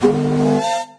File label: drink; There; ThereClient; captaincola